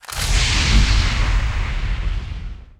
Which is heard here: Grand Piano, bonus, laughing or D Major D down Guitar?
bonus